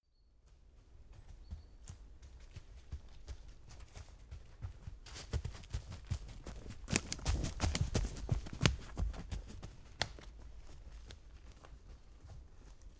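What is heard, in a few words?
step forest pass run, footsteps; schritte; menschen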